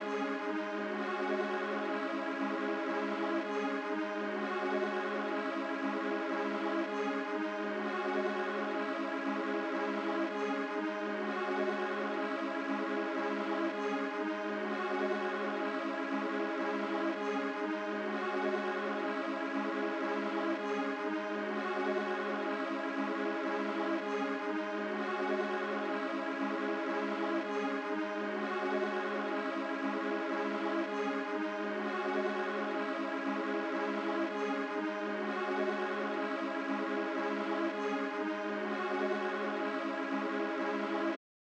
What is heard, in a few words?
140 BPM C# Min Strings; Loop Stems; Cymatics; Too Tough; 2022 Melody Collection; Drill